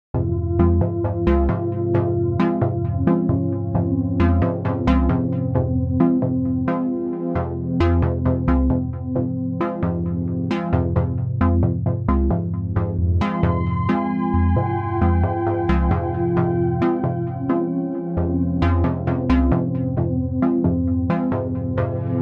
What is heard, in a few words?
core instrumental